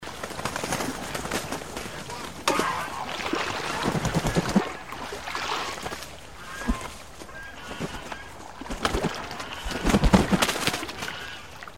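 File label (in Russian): flapping wings of frightened ducks and geese on water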